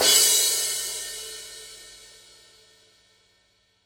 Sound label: Paiste signature 15 fast crash edge